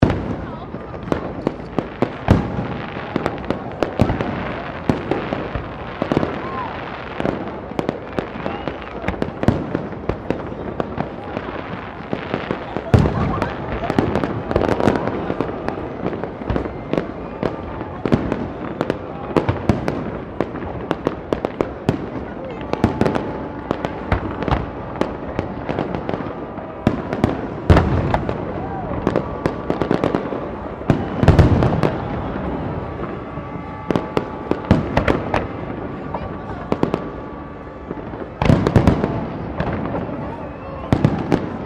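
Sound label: Ambience New Year's Eve Melbourne Crowd Fireworks; New Year's Eve 2008 Fireworks; Firework Sound Effects; Foundation1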